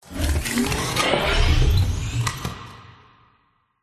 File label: robotical power up sequence